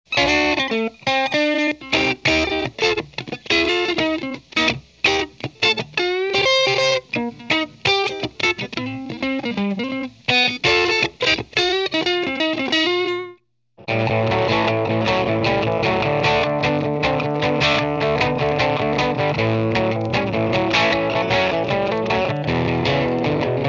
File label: dirty AC-30